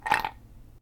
Ice In Glass

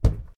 Basketball

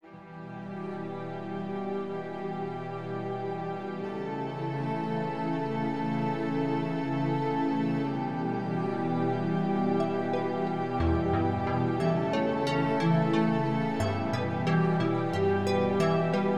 Dreaming

